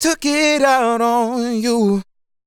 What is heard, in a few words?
Sung Phrase Dry, 150 BPM G Min; Took It Out; Cymatics; Euphoria Vocal Sample Pack